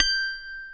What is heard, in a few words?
G5 dulcimer; AKAI S6000 CD-ROM; WorldSounds